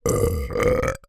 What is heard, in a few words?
human burp gassy